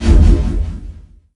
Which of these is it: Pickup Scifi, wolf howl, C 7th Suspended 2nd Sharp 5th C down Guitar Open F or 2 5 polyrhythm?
Pickup Scifi